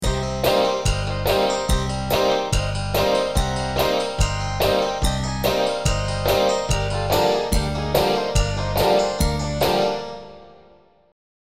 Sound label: sad guitar